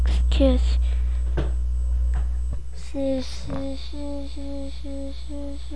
sea

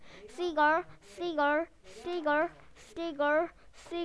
seagull

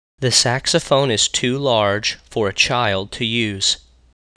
saxophone

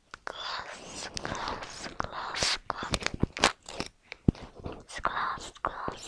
glass

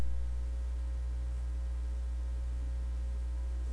whistle

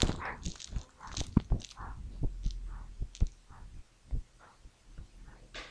harp

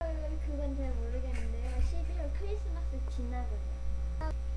knock

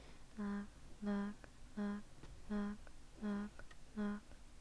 knock

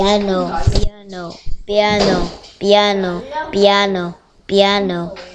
piano

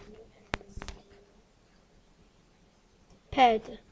pad

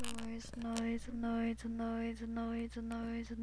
noise

